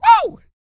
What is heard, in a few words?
YELL, MALE HUMAN; Spectrasonics Vocal Planet